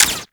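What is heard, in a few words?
crossbow Mech; Warframe; Tenno; Primary Xbow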